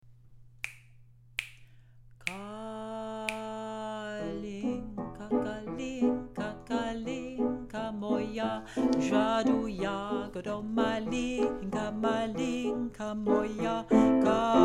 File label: kalinka bass